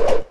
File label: hit